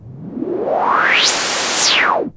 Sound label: Yamaha CS5, Filtered Noise; noise hiss crackle